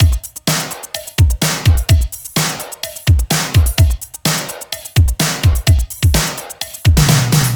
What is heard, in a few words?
Retrofit Drums Fill, 127bpm; Zero-G - Soundclash Nu Dance Electro; Construction Kits